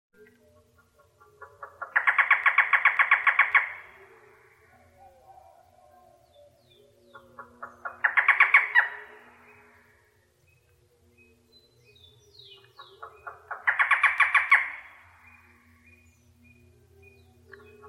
suara barredforest

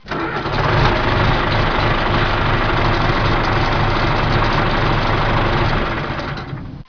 suara mobil

